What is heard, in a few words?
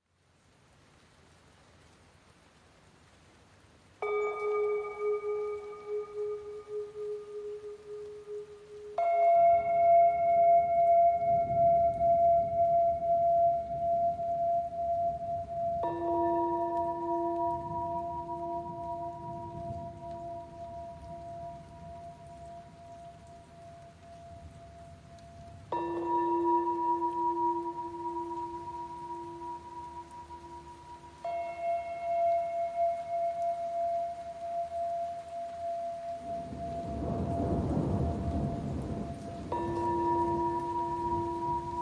Tibetan Bowels for Yoga on a Rainy Night